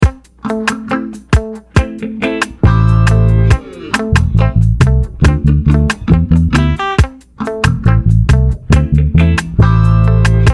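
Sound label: Minor pentatonic groove, bass; a bassists guide to modes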